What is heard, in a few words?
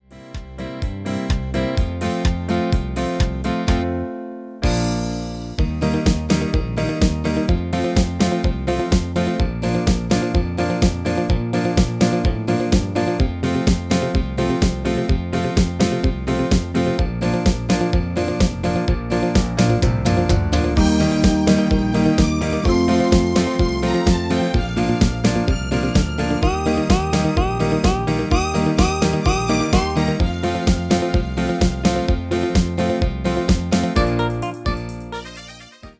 MIDI